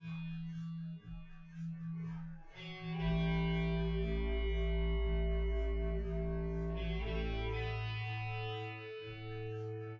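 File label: with drums synth conv 5cm sim; SASSEC07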